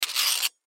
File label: Aluminum Metal, Aluminum Metal Scrape Cement; Materials; Foley; Sound Effects:Materials; SoundEffects-Blastwave